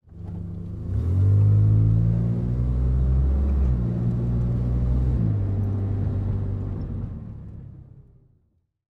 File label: Car Acceleration; Cars